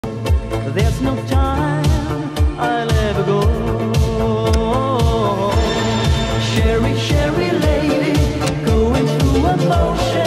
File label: 80s music, retro, disco; Mercedes Benz 1993; nostalgia